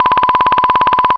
blip blip blip

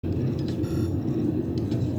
Alien amb; Resurgence

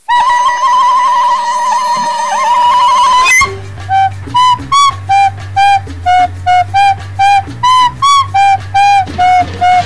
mission flute

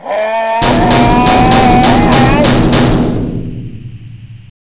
vent beat

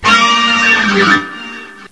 fail; sven

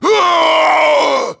death, grunt; nimble grunt

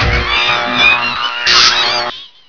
tubryo shock

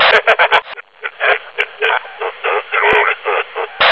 grunt laugh

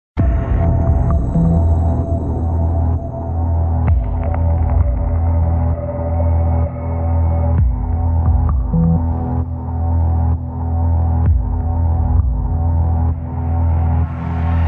hacking ambience

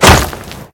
stone pick stone

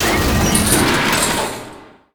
door

